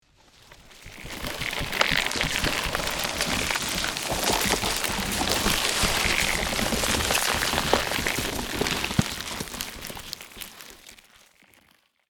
Magical forest growth vegetation nature

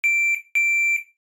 Appliance button press tone; Home and Household

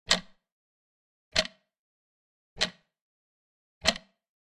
Old vintage clock ticking

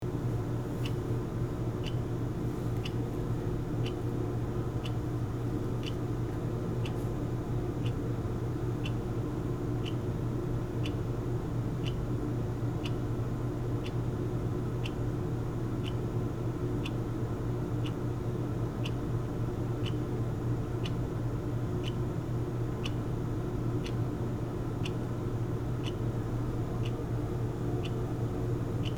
Kitchen ambience appliance hum and buzz loop

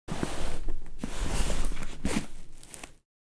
Pack clothes in suitcase

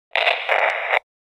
CB radio walkie talkie static interference

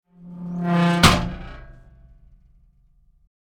Tank hatch opening

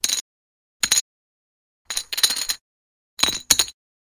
Poker chips thrown on metal table

